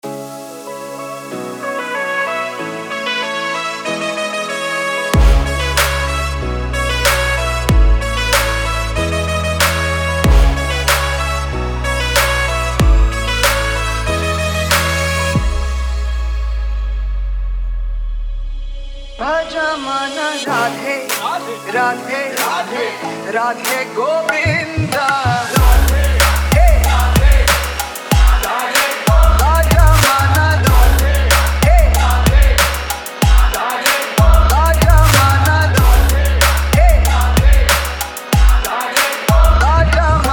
Electronic Bass Remix

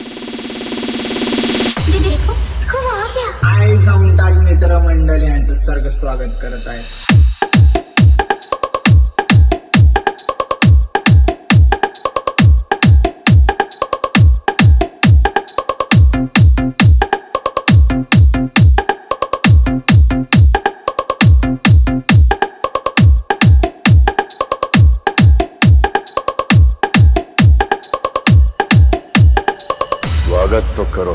Entry Beat Competition Remix

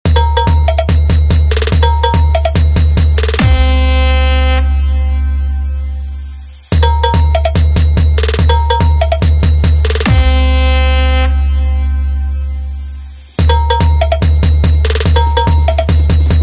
Vibration Music Beat

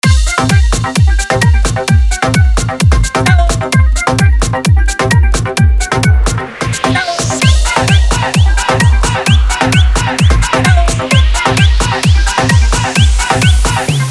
Remix

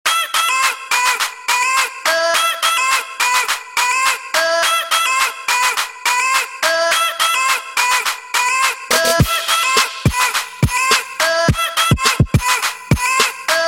Remix

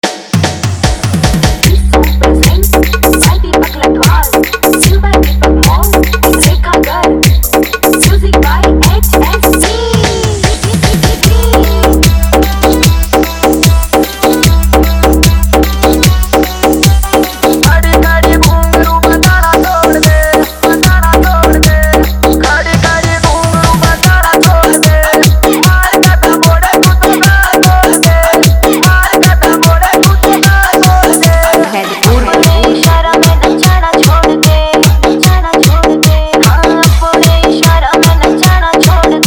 Haryanvi Gms Mix

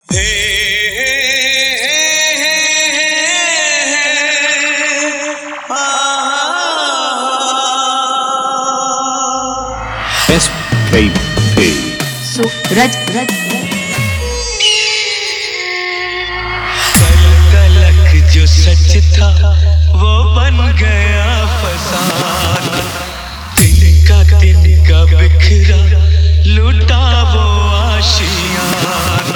Sad Version Vibration Song

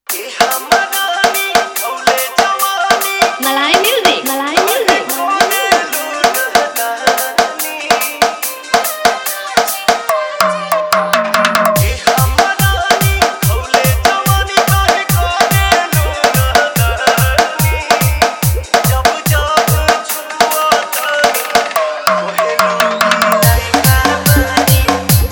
Bhojpuri Remix

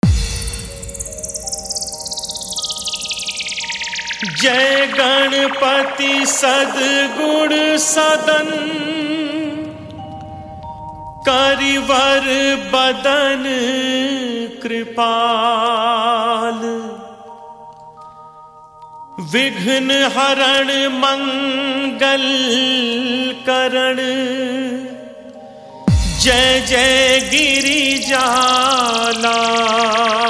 Ganesh Chalisa Dj Remix

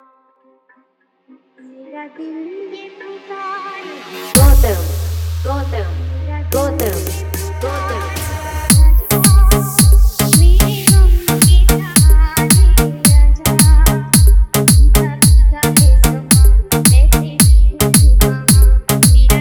Remix

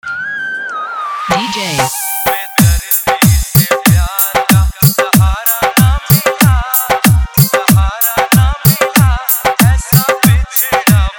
Dholki Mix

